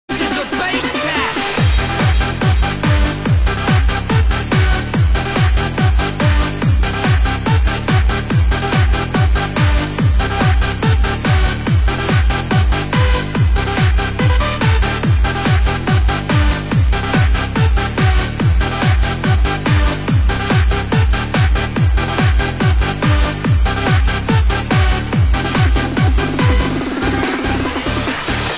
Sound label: tune up bass test